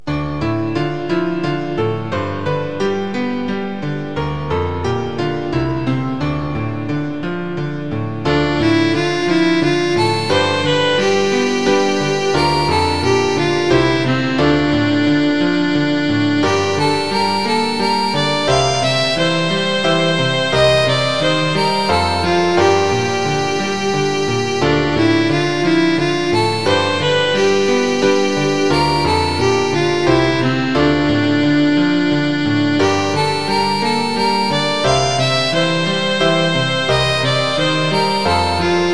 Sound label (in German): violine u klavier; elegie nr 1